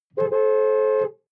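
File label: sports car interior horn rhythms; verkehr-transport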